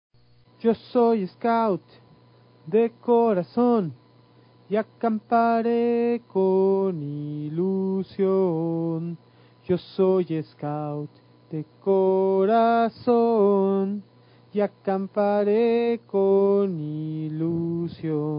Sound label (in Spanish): Yo soy scout vocal